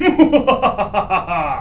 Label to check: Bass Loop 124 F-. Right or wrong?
Wrong